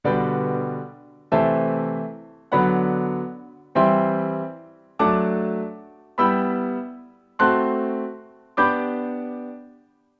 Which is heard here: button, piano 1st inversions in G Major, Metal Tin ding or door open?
piano 1st inversions in G Major